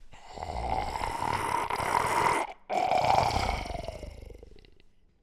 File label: zombie say; minecraft; TBMPack